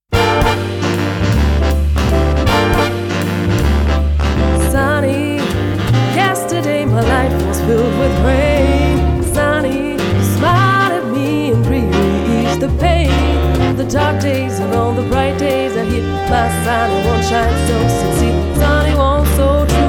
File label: sunny bigband